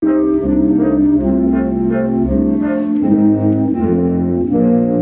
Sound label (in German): test, hohes grundrauschen nach mastering